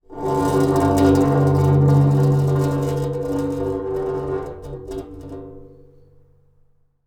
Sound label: Pane Of Glass Scrape